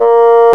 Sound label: Bassoon; CMI